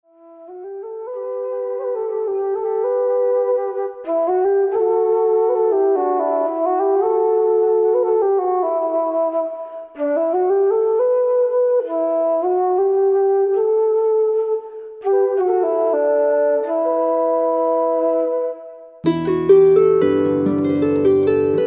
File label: harp